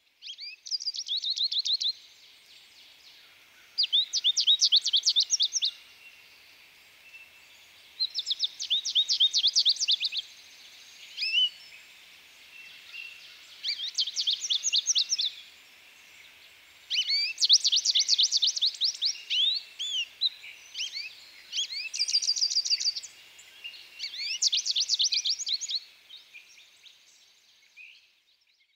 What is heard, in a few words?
American Goldfinch Song